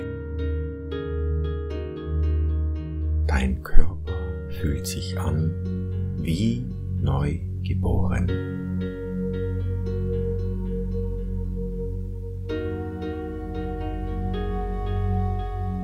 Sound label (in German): Tibetisches Heilritual